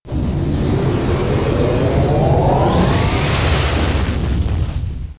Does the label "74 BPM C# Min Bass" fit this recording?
No